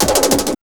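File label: sequence tom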